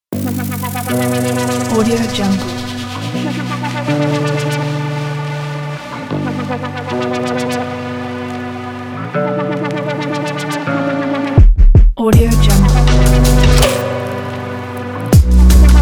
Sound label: futuristic